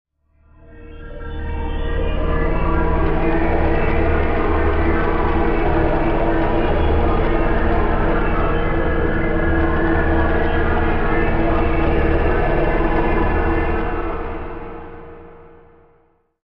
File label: Music Eerie Compute, pads